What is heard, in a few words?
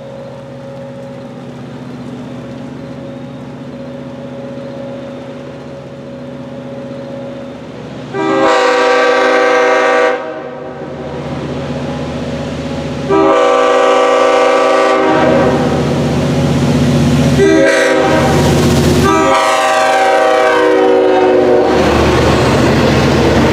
rolling past in a storm, K5LL, horn; Mill Neck; lirrhorns